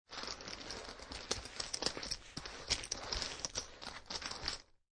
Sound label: Poker Chips And Cards Moving On Felt